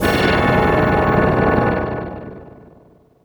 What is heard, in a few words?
CHORD, 145 BPM; Ueberschall; Drum & Bass Resonance